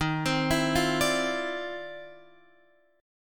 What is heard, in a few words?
D-Minor 9th, Eb, Guitar, Standard; x,6,10,9,6,10; Dm9/Eb arpeggiated